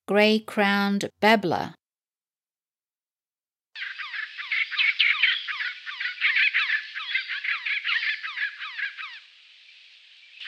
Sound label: Grey Crowned Babbler